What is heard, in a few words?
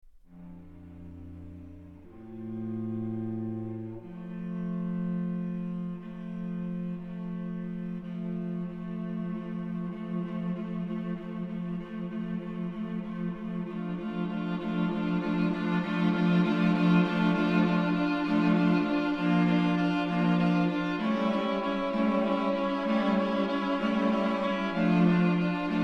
String Quartet No. 3 IV